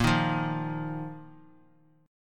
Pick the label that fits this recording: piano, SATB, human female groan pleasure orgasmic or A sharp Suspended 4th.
A sharp Suspended 4th